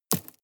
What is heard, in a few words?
drop stones small hard